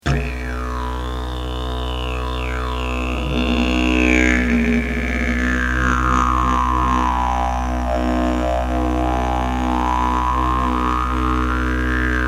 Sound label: didge